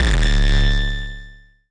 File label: nasty stormfront, Electro FX